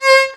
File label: VIOL C4, VIOLON, Arabian Traditions; USB Soundscan vol.43; AKAI